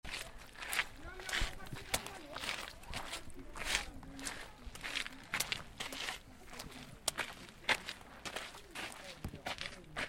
AMBIANCE MARCHE FEUILLES CAILLOUX; college vallee du thore

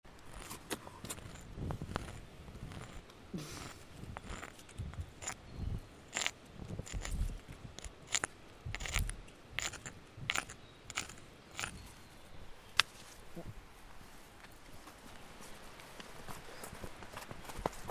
AMBIANCE MARCHE SENTIEN A LA FIN; college vallee du thore

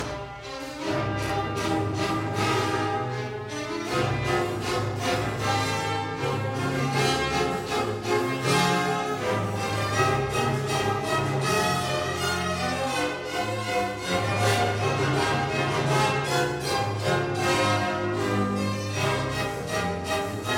Musique du generique

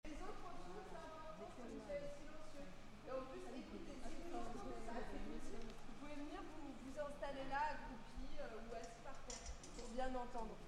AMBIANCE BRASSERIE

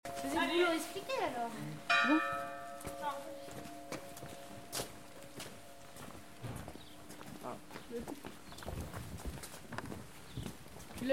AMBIANCE CLOCHE PAS; college renee taillefer; ambiances diverses

